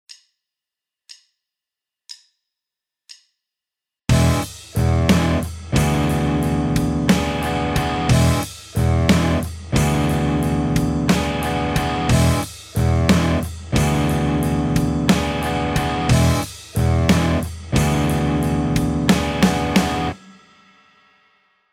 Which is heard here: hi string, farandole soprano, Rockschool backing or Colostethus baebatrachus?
Rockschool backing